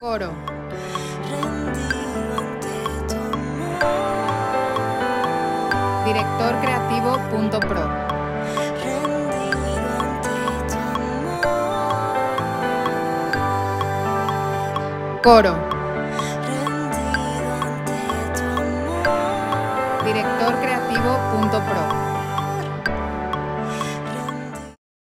G 63 4 4

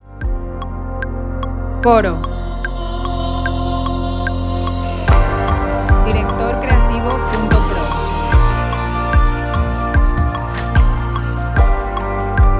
Lead Bb 74 4 4

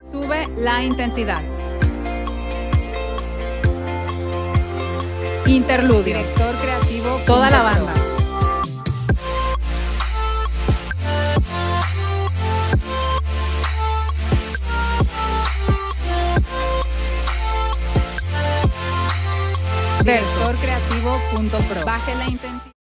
F 66 4 4

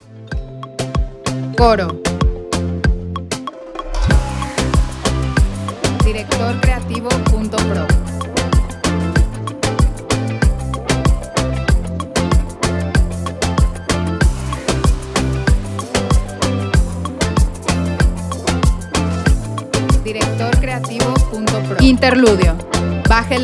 Lead C 95 4 4

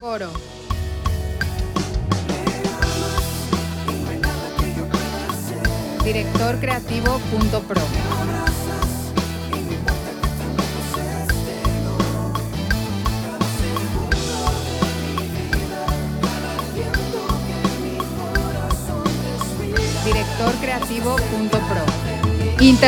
Em 85 4 4

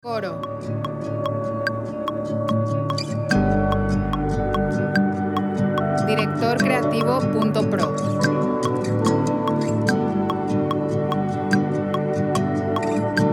73bpm 4/4 D

